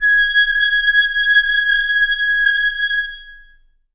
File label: reed acoustic, clarinet